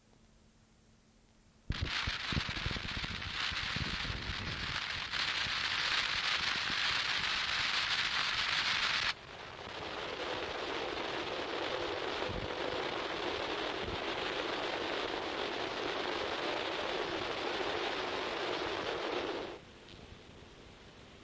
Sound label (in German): CW-Signal mit Filter und BFO